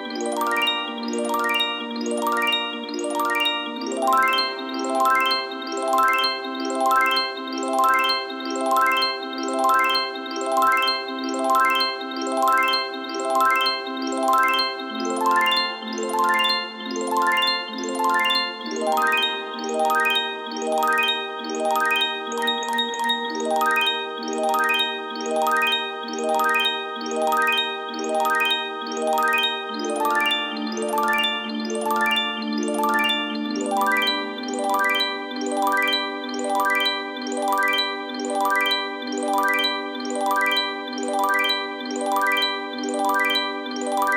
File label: count Up Loop; temple of nudges